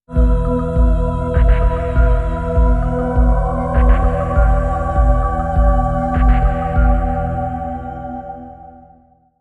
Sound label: Beat Sting